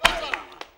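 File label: CLAP + VOCAL, MCLAPS+VOX; Best Service; World Colours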